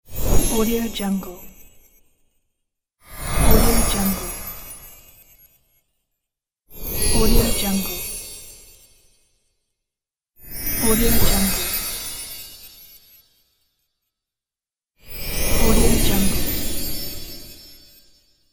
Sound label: christmas whoosh; garnavutka nonexclusive